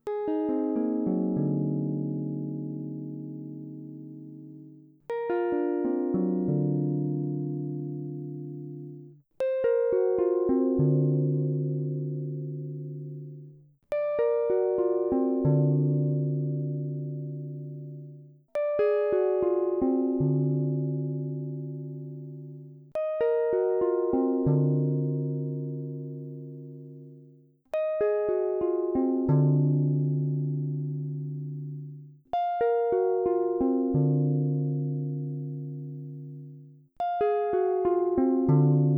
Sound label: d7 altered chords